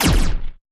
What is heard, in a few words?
Heavy Noise Zap